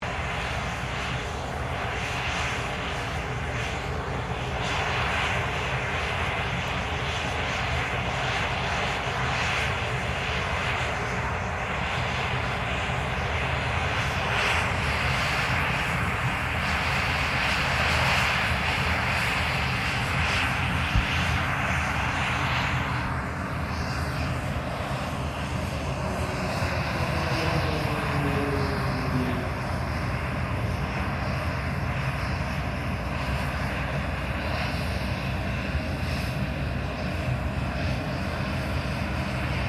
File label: AIRCRAFT AIRPORT GENERAL JETS, General Tarmac ambience; Airplanes; Vehicles